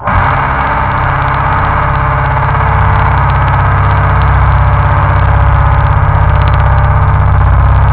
dim 2 sus, guitar; da capo vol1

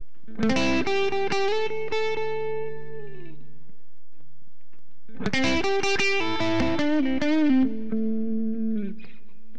cool guitar; AcidJazz Funk; LiveAct Pool

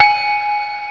Send Sound; Plateau; Bo-Rad! v1.01

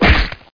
punch

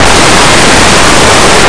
FAN

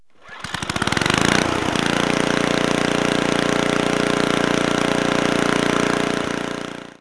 blower; KABOOM! - Wild and Wacky Sound Software; outdoors elements

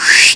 Swish

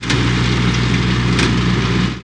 Scroll; panzer

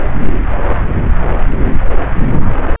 shield gen; ENGLISH; Far Gate

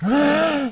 gasp; Hang2000 v1.1

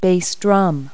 bass drum; Multi-Lingual Talking Picture Dictionary

